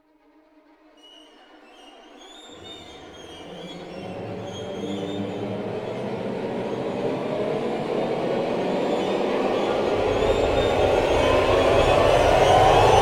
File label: Choir